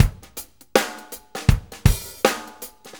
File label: Shuffle Loop, Full Kit Loops